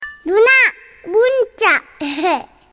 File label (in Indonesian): nada dering wa bayi korea